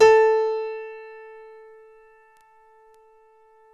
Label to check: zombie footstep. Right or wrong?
Wrong